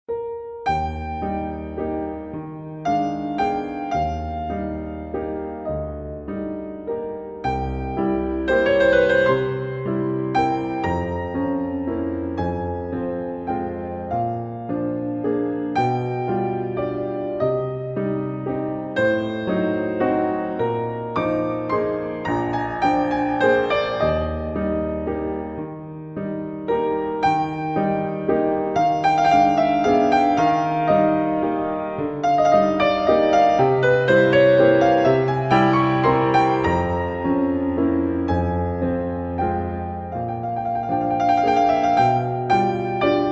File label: Nocturne in E-Flat Major